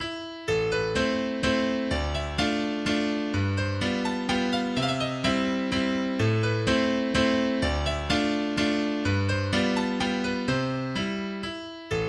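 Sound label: Waltz No19 in A Minor, MIDI; Opus Posthumous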